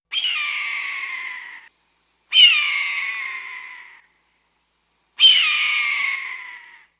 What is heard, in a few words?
Red Tail Hawk